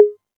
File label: 808 Conga Hi, Novation Drumstation; Novation DrumstationV1